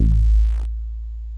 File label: jazz bass